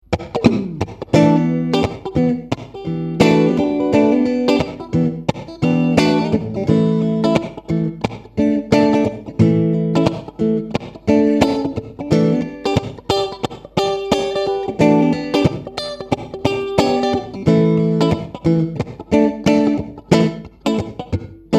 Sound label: Neck Bridge Single